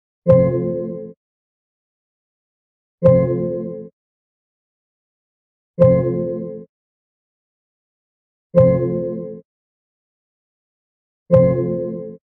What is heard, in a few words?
Siri AIs Sound Effects; iphone original ringtones